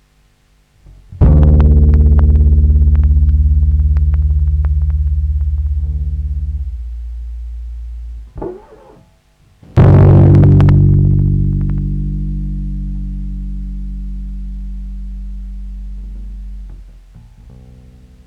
filter impro

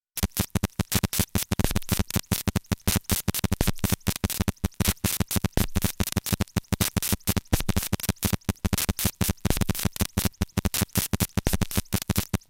Rua da Alfandega, Lisbon; Fieldrecordings

